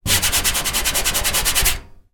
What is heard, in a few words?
foley fingers rub metal gas fire hood